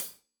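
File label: hat closed, hi-hat cymbals stereo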